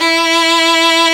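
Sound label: STR VLN, violin; AMG - Now CD-ROM (Roland); Art of Samplng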